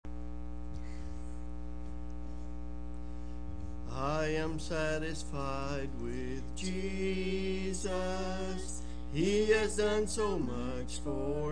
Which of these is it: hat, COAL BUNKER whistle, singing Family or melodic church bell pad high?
singing Family